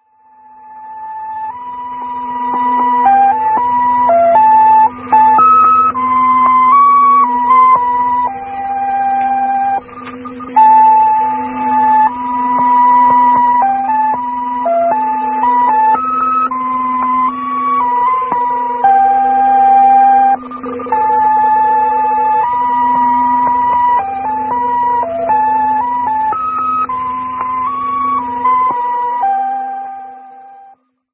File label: polyphonic tones